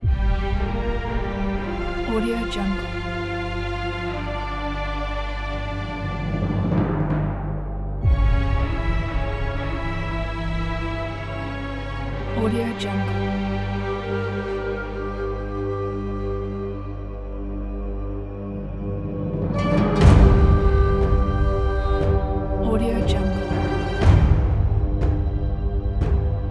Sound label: dark arabian